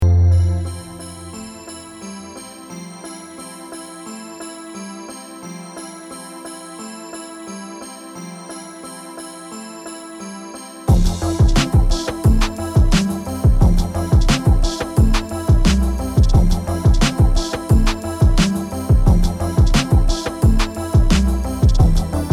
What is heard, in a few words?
New Age